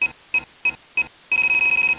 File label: semtex; cstrike; codlife